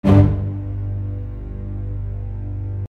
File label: String Hit